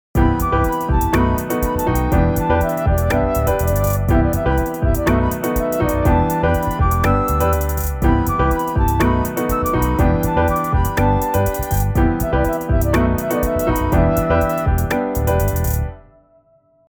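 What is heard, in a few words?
Session Player Block Chords; 調整後のフレーズ（楽曲全体）; ピアノ; Logic Pro 11; ベロシティを高く設定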